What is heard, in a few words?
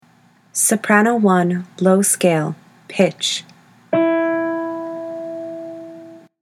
soprano i scales low; all-state choir